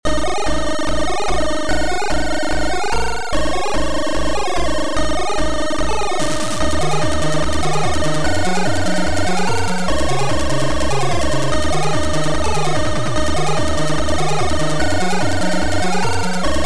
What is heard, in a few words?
Dron